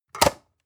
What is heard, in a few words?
storage lid slide close hard, box; objekte